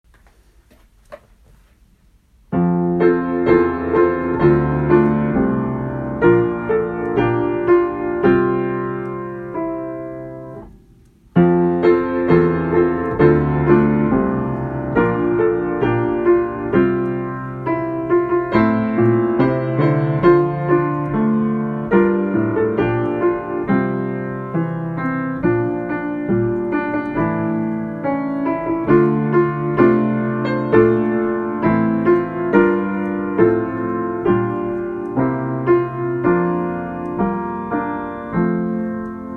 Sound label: piano